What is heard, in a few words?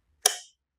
LIGHT SWITCH; memes